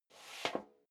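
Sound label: step leather shoes metal dry scuff, footsteps; schritte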